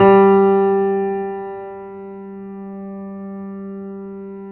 PED MF, GRAND PED

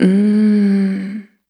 Vocal Samples, Single Voice Melodic Loops; Singles

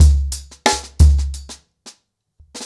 90BPM, ACOUSTIC DRUMS

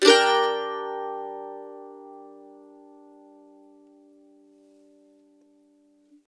CEE CHORDS GM, Blues Guitar Samples

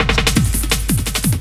LOOP SD, Drum & Bass, 170 BPM

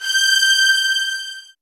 SOFT VIOLINS

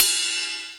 HH, HIHAT HIP, Dance Mega Drums; Best Service

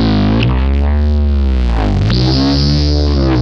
Funk Guitar; 90 s Sample CDs; Zero G

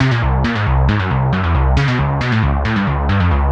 Bass 136bpm C; horrorscape

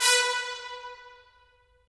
BRS, Tps Stacc; Roland - LA Composer vol. 4

